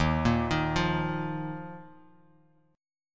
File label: Eb Suspended 2nd, Bass Standard; Ebsus2 arpeggiated; JGuitar